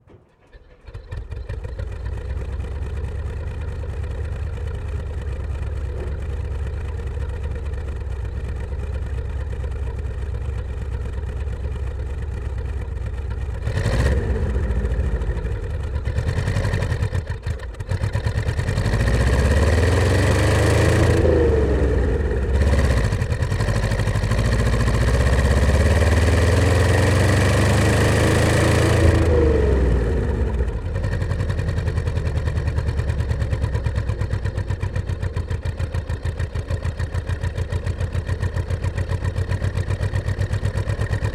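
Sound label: BRDM2, On board, Drive, Ramps, 3rd Gear, Exhaust, Mix; BRDM-2 1972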